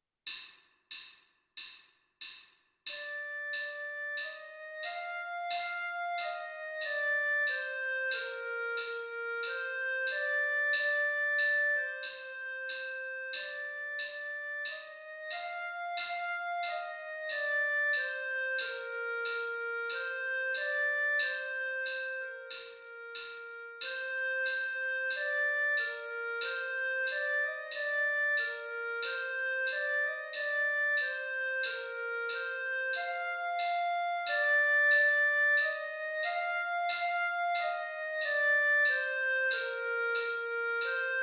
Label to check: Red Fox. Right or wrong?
Wrong